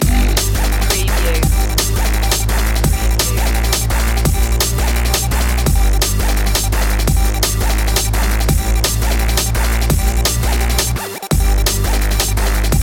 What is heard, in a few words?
subharmonic panic loop